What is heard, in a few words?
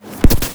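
attack croc saw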